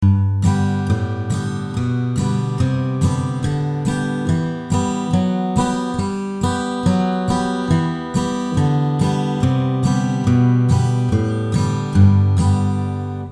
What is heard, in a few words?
aag scale